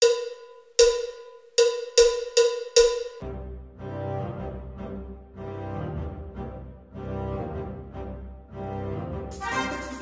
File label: Spanish Dance